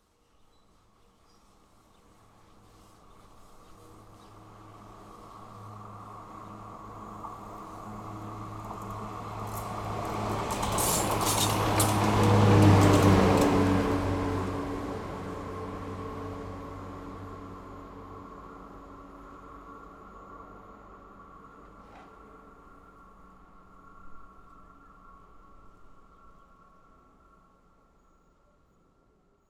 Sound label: Train short RODE